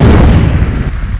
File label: missile; Uprising